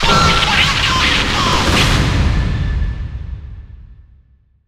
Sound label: catch; drg huggy wuggy snpc; slender; corruptedwoody3; new cwoody